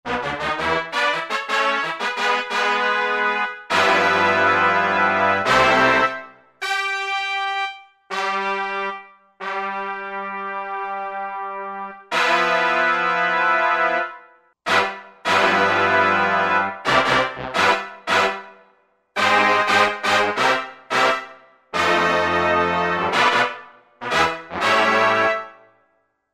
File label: Brass Vel.Fall, Roland JD-990; Factory Patches